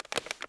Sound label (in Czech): vrz; Mafie 1